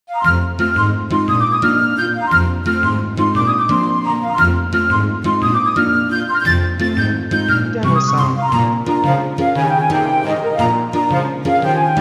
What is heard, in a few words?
Lively Playful Mood Bouncy Flute